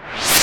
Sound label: Whitesweep; Atmospheres; Ableton Foundation FreePacks